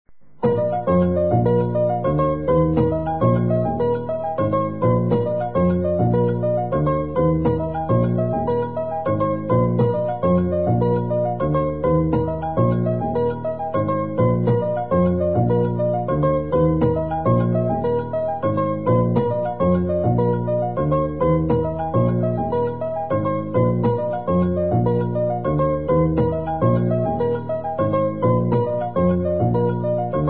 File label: Merengue, Piano; Piano diary 2000